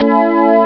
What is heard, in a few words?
pluck organ; Amiga Soundtracker Sample Packs